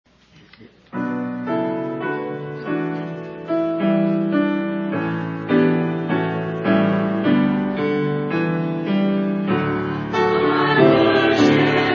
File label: choir